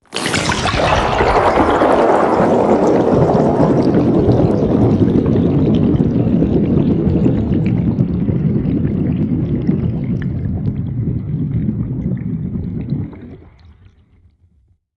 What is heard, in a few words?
hydrophone water splash and flow